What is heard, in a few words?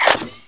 flamer reload; gusanos promode